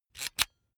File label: electric drill template slide in medium; maschinen; bohrer; soundpool